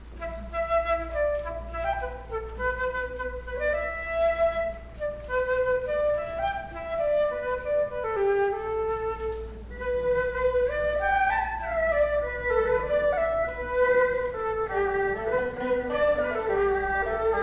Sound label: trio folkmusic prelude